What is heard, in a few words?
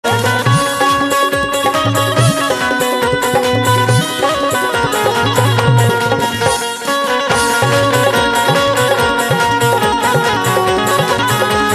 Banjo Instrumental Ringtone; devotional instrumental ringtone